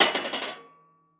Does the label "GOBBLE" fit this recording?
No